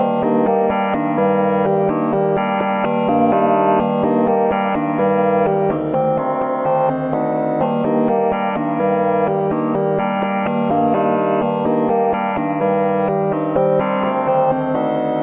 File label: 126 angular mechanics, synth and electronic loops